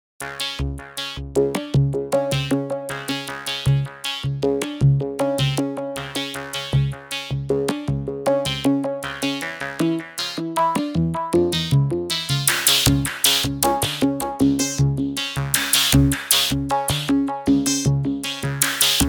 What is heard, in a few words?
Artificial Intelligence Loop